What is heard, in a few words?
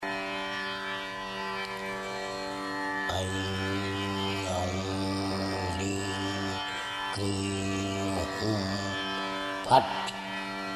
tara mantra; yoga; mantry